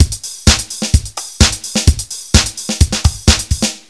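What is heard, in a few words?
drum loop; Descent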